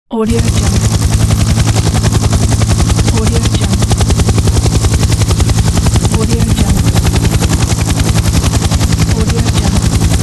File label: helicopter; صدای نزدیک هلیکوپتر